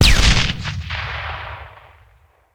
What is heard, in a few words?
Grenade Launcher, Contained Blast; gun and weapon sounds; captain audio; fully loaded